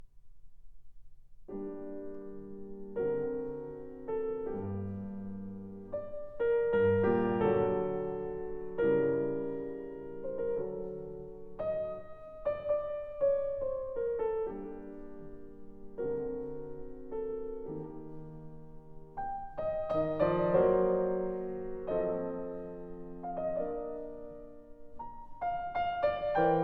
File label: piano sonata in e flat major andante molto